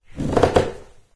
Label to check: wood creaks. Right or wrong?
Right